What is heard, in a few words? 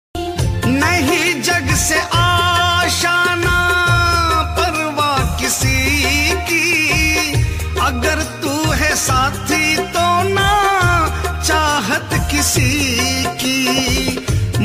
bhajan hindi devotional ringtone; khatu shyam bhajan